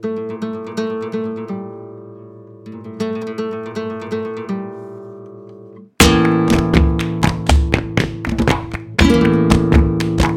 Flamenco Rhythm

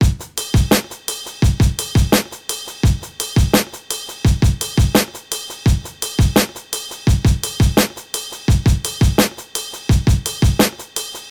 170bpm, Cut-up Breaks

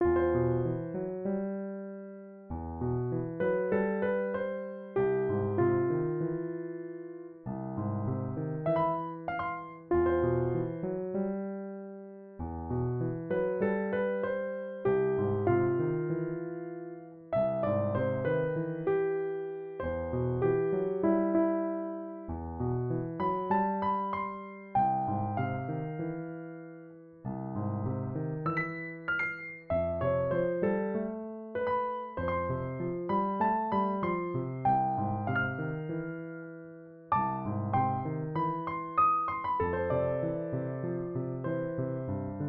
Piano Music

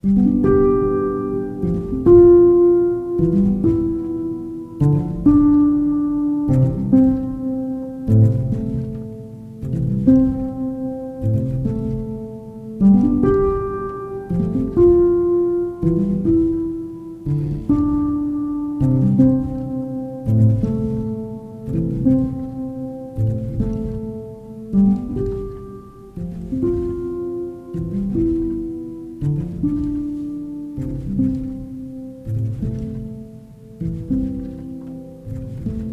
Collapsing Slow-mo, Piano Felt

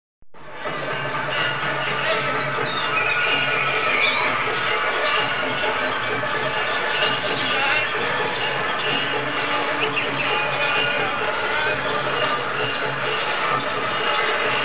Music From Pushkar, Field Recordings From India; Field Recordings From India Nepal; Jani Hirvonen

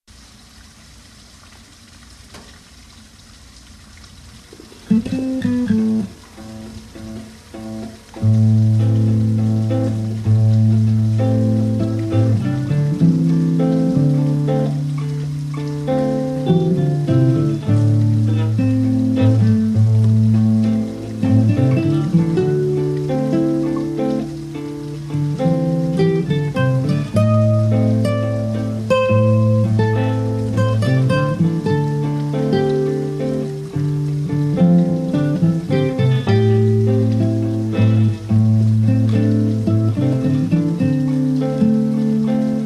Guitar Rain

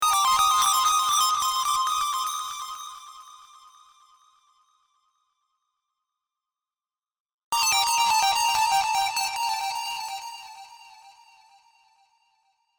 128 new jack synth; Loop Mania Sampler Pack

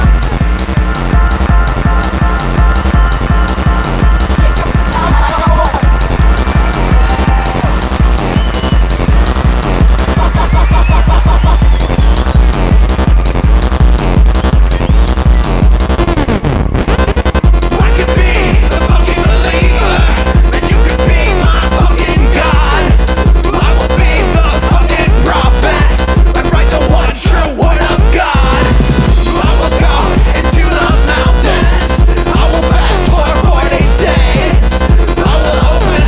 Death to False Techno

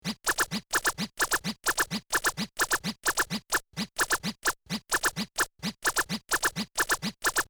129 beat with a weep but without kick; Loop Mania Sampler Pack

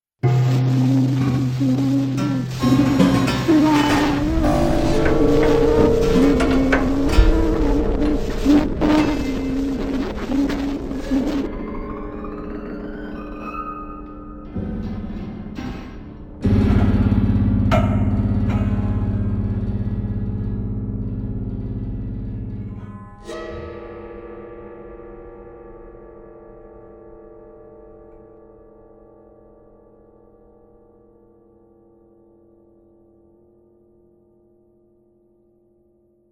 Prepared Piano Piece; Prepared Piano Piece X

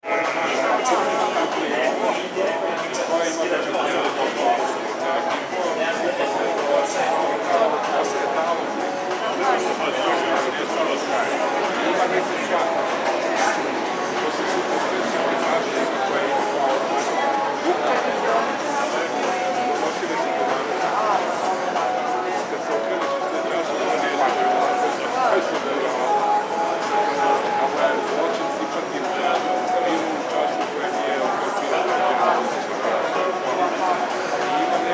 Lounge Bar Belgrade Serbia; Walla

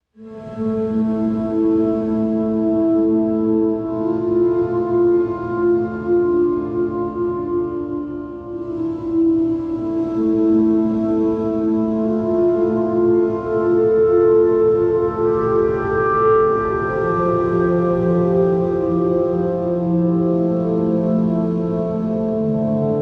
Sad Forlorn Gentle Piano reverb; CUES for Film TV Games etc SOLO PIANO

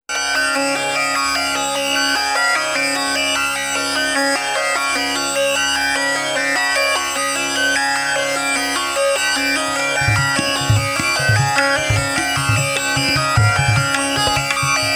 Raga Drone Indian Raga; CUES for film TV games etc

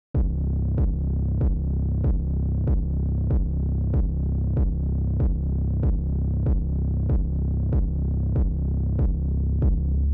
Industrial building lurching epic 95bpm

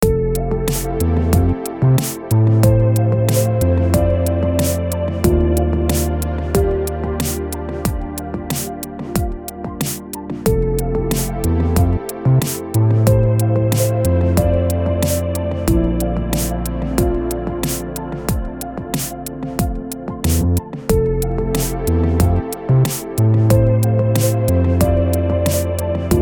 Beach Vibes With Drums; Beat-driven Production Music

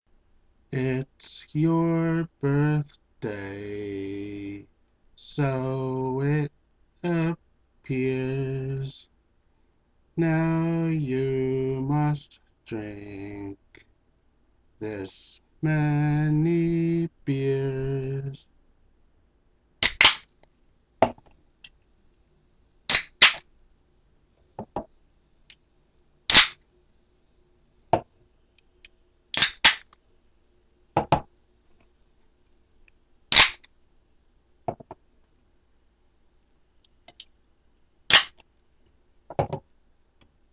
Birthday Chimes

